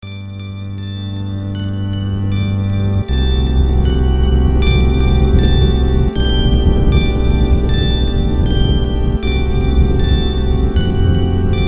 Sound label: Power Up; EarthBound